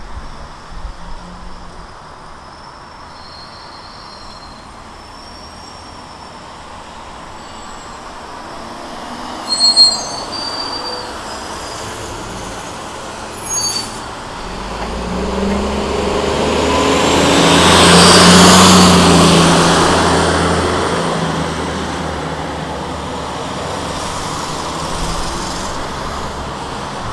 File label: traffic bus cars, street traffic; best service Blue Box